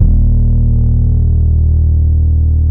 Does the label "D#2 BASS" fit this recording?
Yes